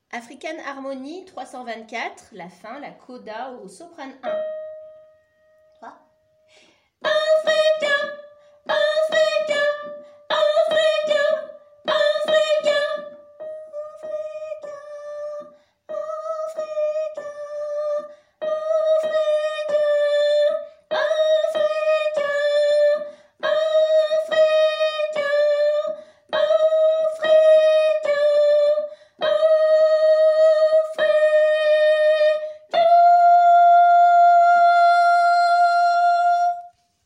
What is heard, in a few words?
African mes soprano